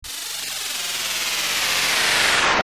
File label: Title Swoosh; Dungeon Master; SNES